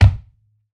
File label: stomp